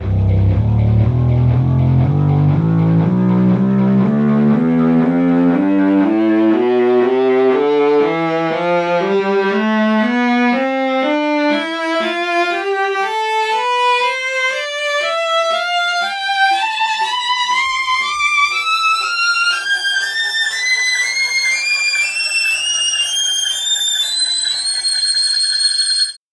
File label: viola tonleiter